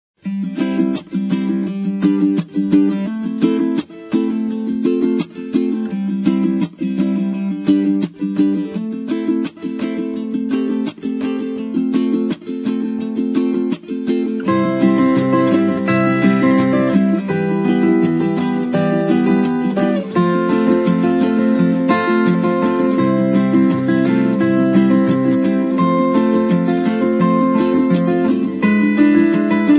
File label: instrumental